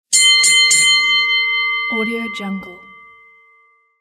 boxing bell

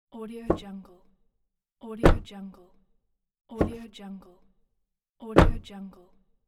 car door open close

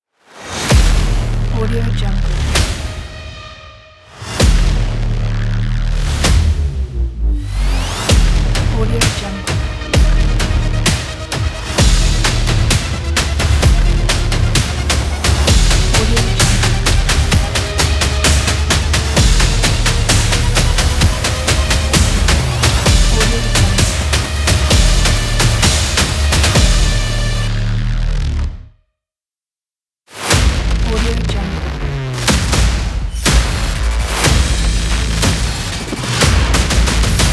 action trailer logo pack

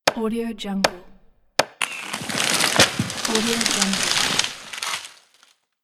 felled tree

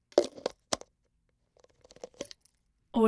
squirt bottle

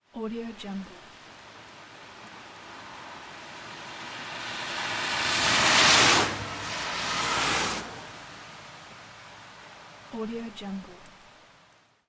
rain road; crisstyna

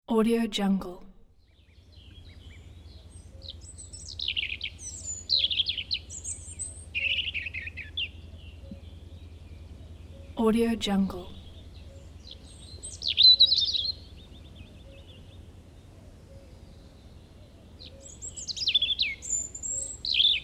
summer forest ambience

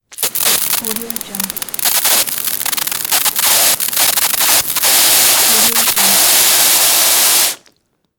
water pressure leak aerated fizzle

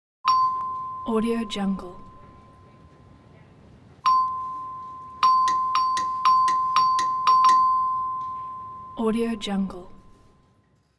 desk bell

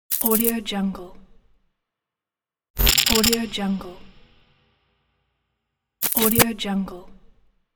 intro glitch

